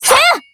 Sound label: slash